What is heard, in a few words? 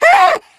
pain, voice; video game; source engine; left 4 dead 2; spitter